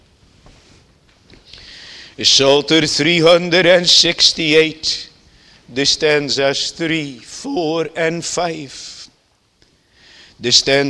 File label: Singing; isaiah 25 9